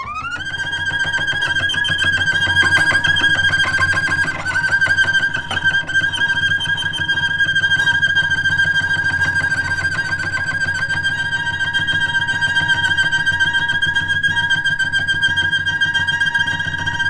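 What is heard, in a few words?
High Tremolo Guitar Celli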